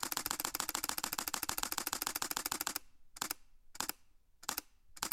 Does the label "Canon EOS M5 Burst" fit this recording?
Yes